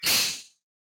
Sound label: snake attack